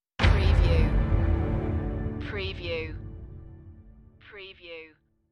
Trailer Production Elements - Hit - Final, Focused, Chord